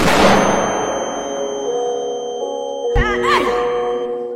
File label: flashbang gah dayum